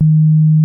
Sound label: SINE WOOF D#3, ANALOG BASS; Music Store - Claudius Diemeter Schwerlos